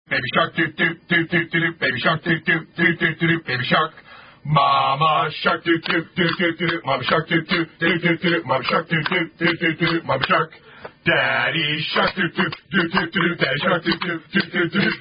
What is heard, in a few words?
[Aka Attack Song]; Ghoulie Games